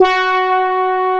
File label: HR G4, ANA HRN